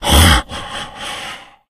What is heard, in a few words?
death, infected male; npc; Left 4 Dead 2; Source Engine